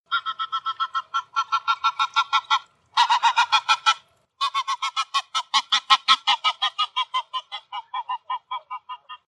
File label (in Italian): oca selvatica, birdsound